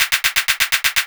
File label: Claps 808ish